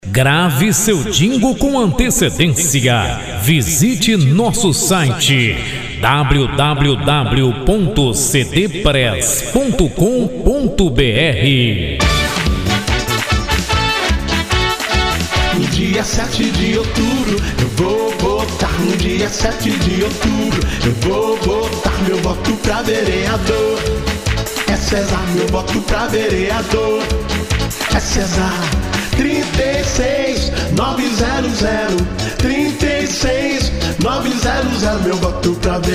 jingle político, música política

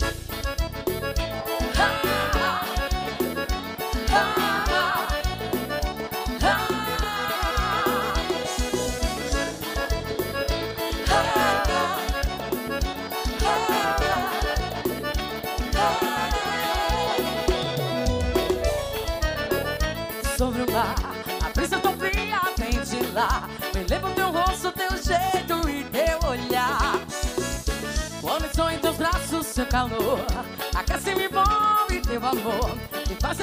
cantora

